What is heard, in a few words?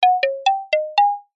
cartoon footsteps short sneaky wooden mallets; zapsplat